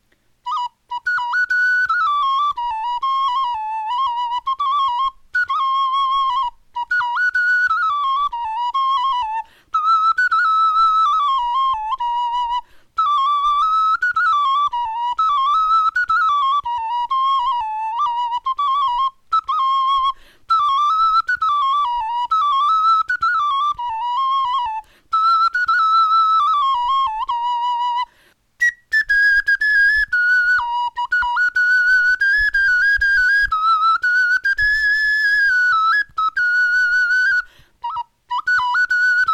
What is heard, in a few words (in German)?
melodie, knochenfloeten